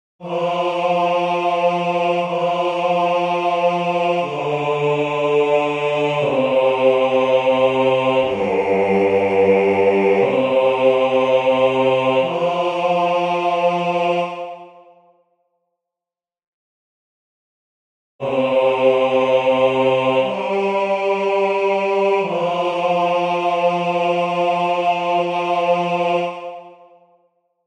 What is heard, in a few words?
first mess about bass